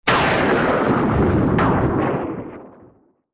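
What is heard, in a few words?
thunder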